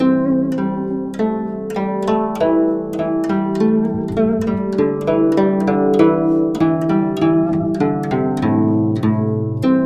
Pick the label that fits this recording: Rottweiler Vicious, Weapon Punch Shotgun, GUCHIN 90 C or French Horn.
GUCHIN 90 C